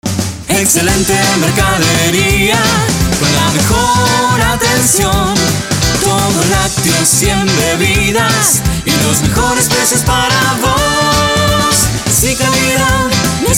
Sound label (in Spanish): Jingle Cantado balada a rock; Jingle Publicitario Mayorista Maxi Compras